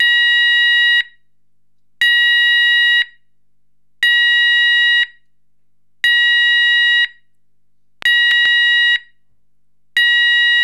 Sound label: Sounder